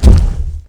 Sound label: forest keeper step; lethalcompany; zerosiki; slender